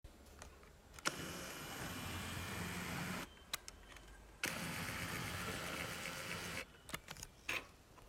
Camera Lens Zooming

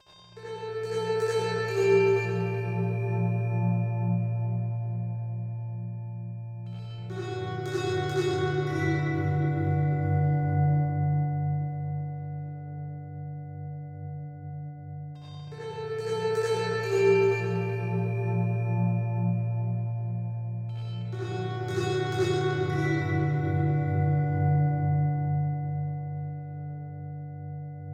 Koto

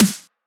Punchy Snare

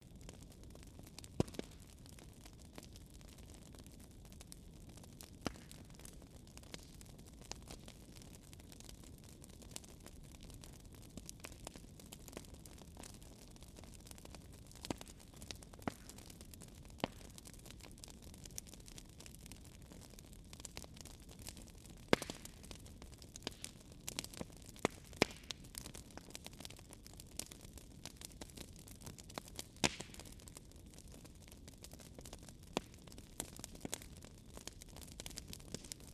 Flames Sizzling Of A Bonfire Fire

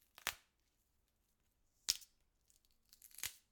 Kitchen Snapping Celery

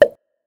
Popping

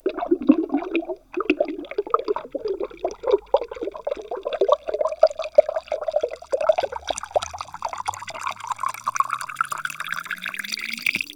Underwater Bubbles

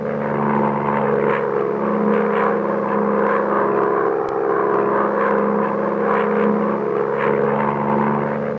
Loop Mustang

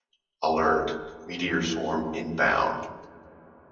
Meteorswarm

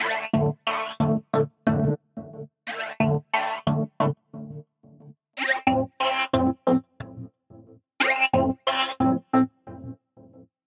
Gated Synth

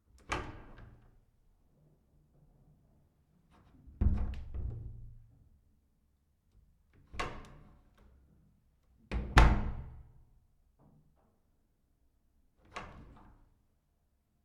Door Open Close Inside

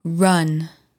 Run

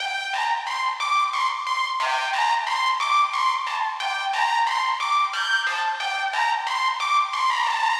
Loop Broken Lead 120 Gm

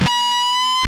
Gtr bnd

